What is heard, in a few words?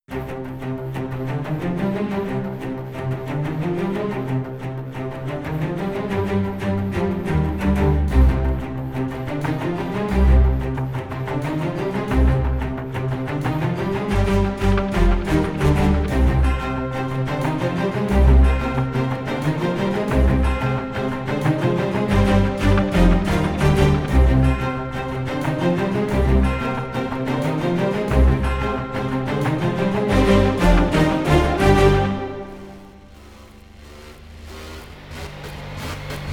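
no percussion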